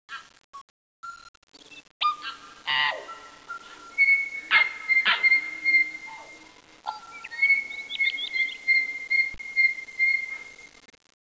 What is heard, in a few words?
tui